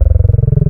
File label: Allophones, Vowel; Synthetic's Serum Collection